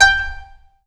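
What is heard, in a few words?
HARPSICHORD STOP G5; Best Service Advanced Media Trax Modern Composer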